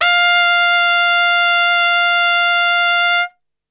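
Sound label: F 3 trumpet straight, Brass; Best Service; Brass Super Section; TRP STRGHT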